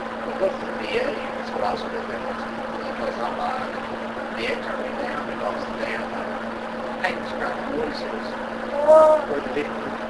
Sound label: House Dining Room Unexplained Moan; Olympus; Case 028 Molly Tynes House